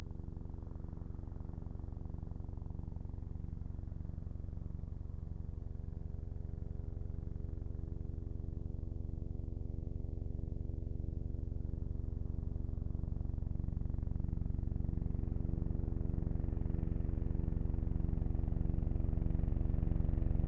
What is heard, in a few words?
recorded Flyover